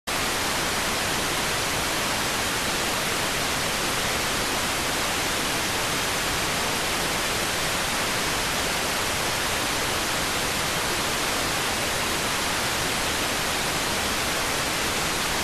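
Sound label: radioteletype receive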